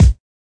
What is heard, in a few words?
kik